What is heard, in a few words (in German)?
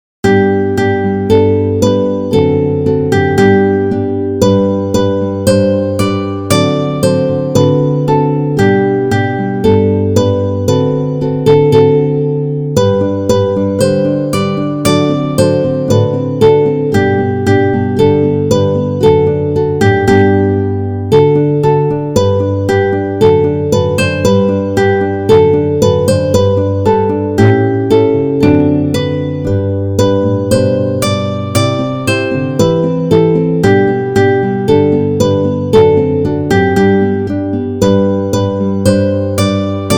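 Freude schöner Götterfunken G-Dur, Gitarre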